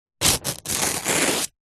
household book telephone directory page thin rip out